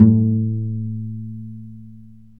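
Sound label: strings violoncello pizzicato, Chamber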